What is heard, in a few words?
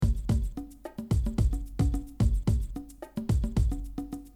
drum loop